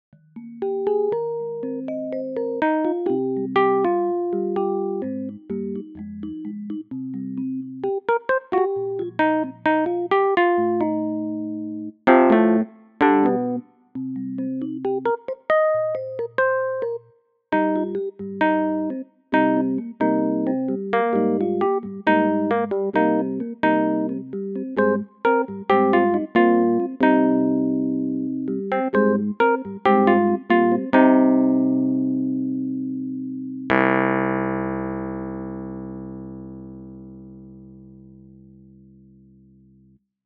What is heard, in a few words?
wurlee